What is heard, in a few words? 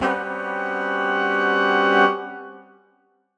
Horn Swell Gmaj7b5; undercover samples